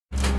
scrap metal hot knock, junk yard metallic and impact fx; nova scrap-yard; nova sound